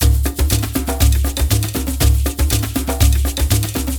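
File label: Djembe; Spectrasonics; Heart of Africa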